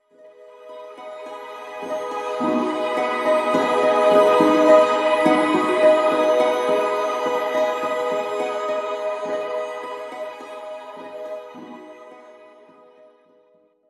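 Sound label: song of discord melody; sona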